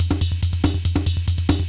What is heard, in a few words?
141 BPM, Drum Loops; Zero-G; Club Techno; Drum Set